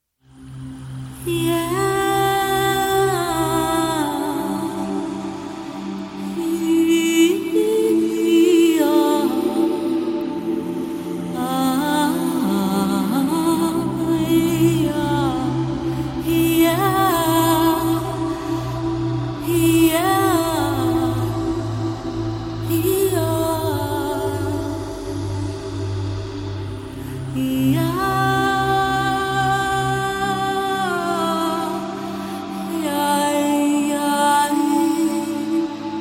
chant of the deep sea

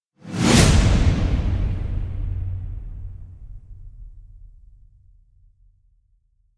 whacks with whoosh; monster

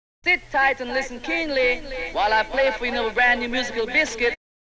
fx and scratches

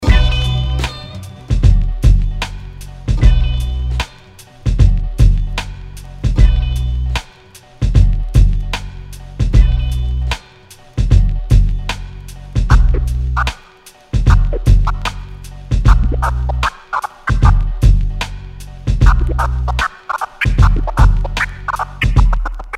outro freestyle scratch

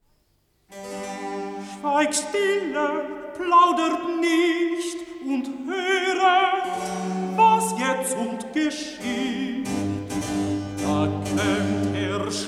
recitativo erzahler; johann sebastian bach; kaffee kantate bwv 211; schweigt stille plaudert

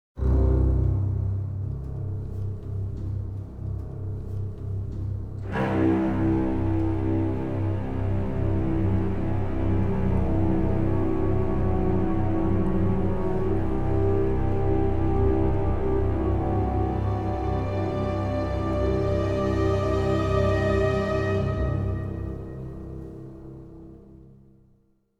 orchestra drone no perc

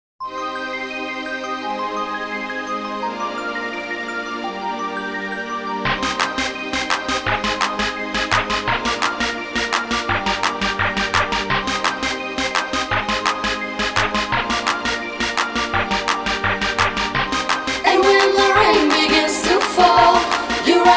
the rain happy hardcore version

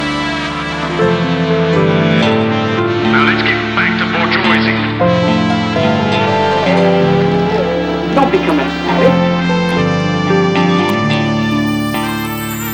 chill out goa trance; closing sequence

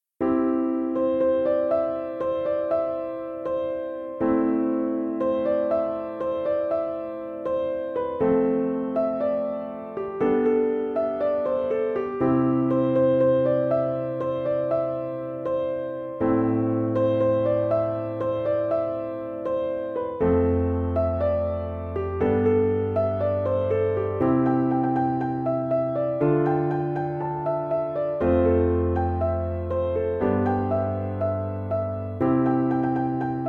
piano instrumental version

